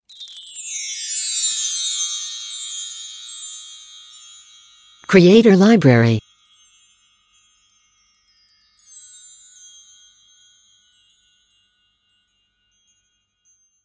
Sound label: Wind Chimes Down